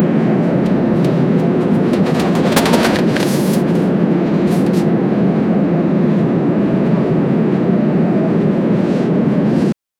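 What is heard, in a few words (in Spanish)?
ventana del avión se rompe; airplane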